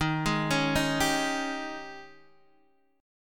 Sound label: G sharp Major 7th Suspended 4th, D sharp